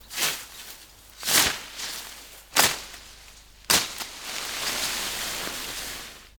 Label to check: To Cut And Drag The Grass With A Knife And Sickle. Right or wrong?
Right